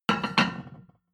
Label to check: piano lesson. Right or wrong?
Wrong